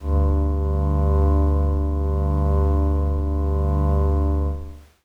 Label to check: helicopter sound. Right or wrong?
Wrong